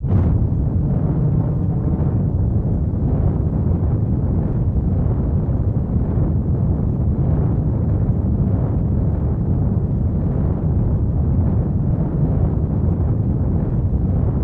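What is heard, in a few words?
hot blow, firestorm; Total Control - Action Cutz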